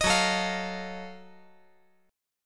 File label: F sharp Minor Major 13th; strummed; JGuitar